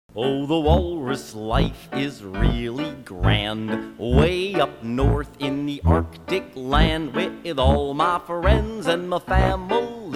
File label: walrus jingle